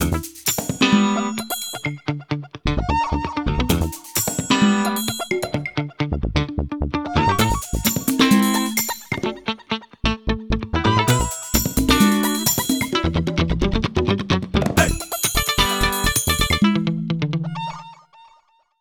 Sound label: Joy Of Poultry, ringtones; Honor; Magic-8-Pro